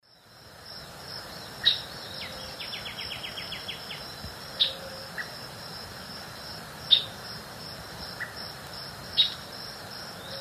Furnarius leucopus, call female; S. America; Eastern Lowlands

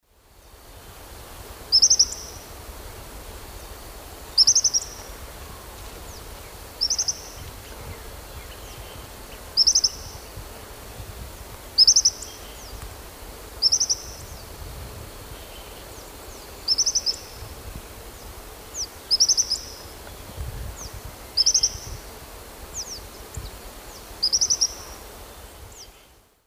Tyrannus melancholicus, song; S. America; Eastern Lowlands

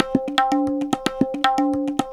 tabla funk 110

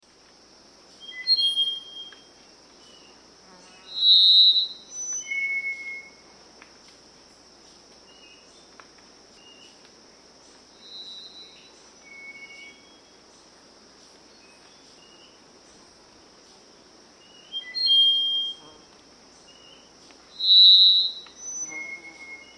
Black-Faced Solitaire, Natures Songs; S. America

